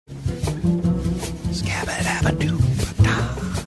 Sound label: scatting along with bossa nova hold music